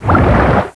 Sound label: stroke; SFX; PC Gamer IT CD 38; thief